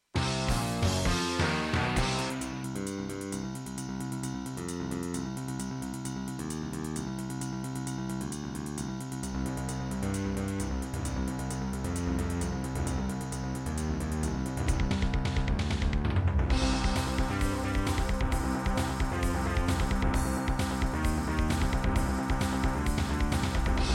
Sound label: Labway LWHA111800 (AdMos QS700); sigil doom episode 5